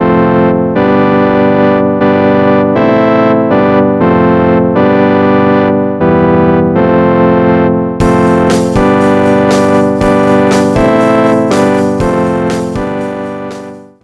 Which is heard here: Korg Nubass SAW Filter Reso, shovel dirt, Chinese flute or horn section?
horn section